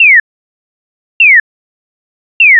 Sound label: Chirp With 1 Second Interval; orientation and mobility